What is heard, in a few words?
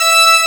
FM ORGAN; AKAI S6000 CD-ROM - Volume 1